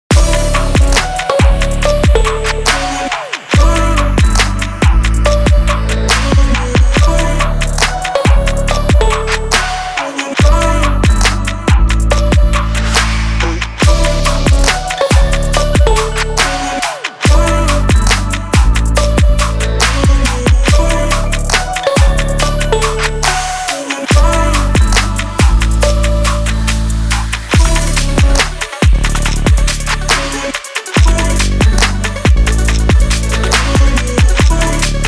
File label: summer travel pop trap